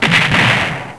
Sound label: boom; rolent